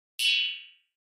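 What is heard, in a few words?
HF Electronic Spring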